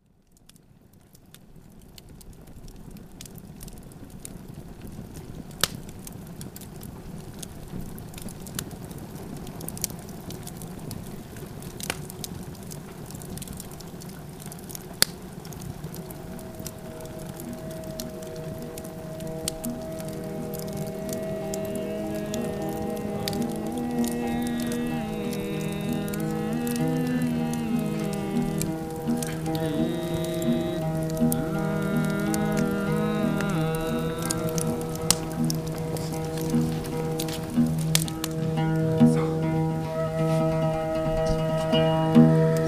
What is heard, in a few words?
Trance Dance